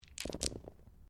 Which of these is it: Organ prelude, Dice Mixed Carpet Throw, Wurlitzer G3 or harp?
Dice Mixed Carpet Throw